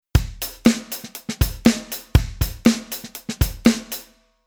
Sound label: Rhythm Funk Shake